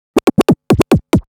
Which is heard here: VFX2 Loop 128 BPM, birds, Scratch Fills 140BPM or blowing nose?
Scratch Fills 140BPM